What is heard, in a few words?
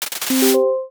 ui inventory fold open